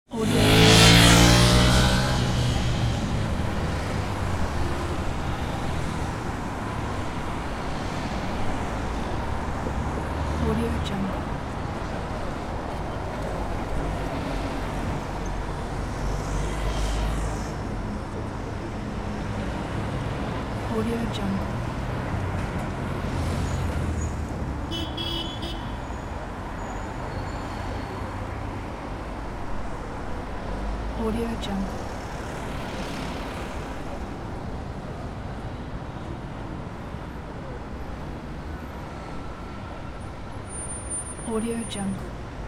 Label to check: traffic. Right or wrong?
Right